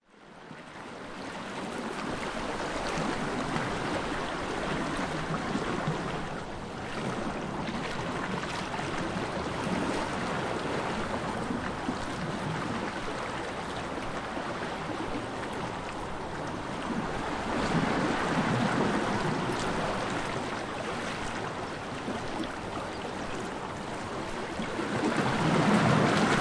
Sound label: Slow Waves At Sea Shore, Gentle ocean waves surge up against rock; Calm ocean atmosphere; Outdoor